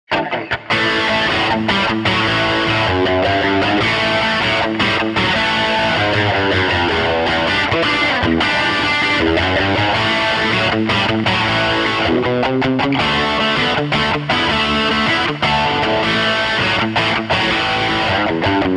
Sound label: blues crunch